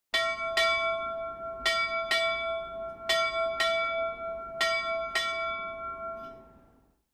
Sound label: Boat bell rings; KMP Film Video Sound Effects